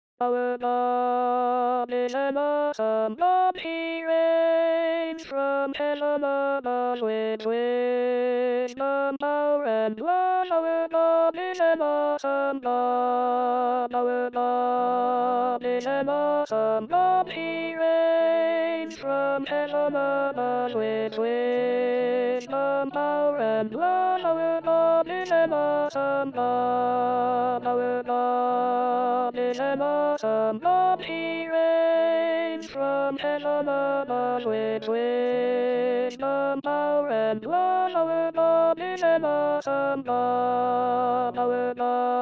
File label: Altos